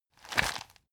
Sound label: plastic bag hit medium